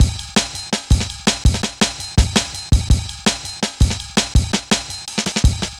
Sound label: Jazz Ride, Drum Loops, 165.5; Jungle; Zero-G Jungle Warfare 1, 2 & 3; Jazz Ride B; 90 s Sample CDs